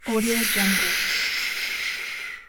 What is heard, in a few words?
inhalation